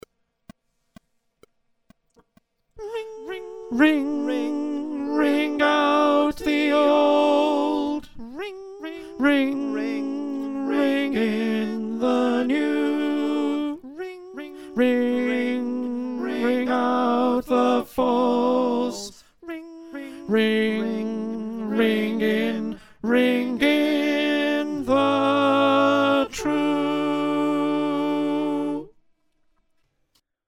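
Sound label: tenor, choir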